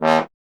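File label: bass trombone D#; Miroslav Vitous; Solo Instruments VOL-1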